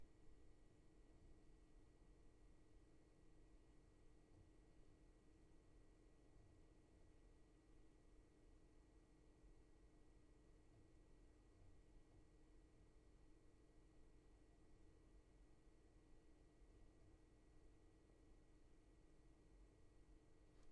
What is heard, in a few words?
room tone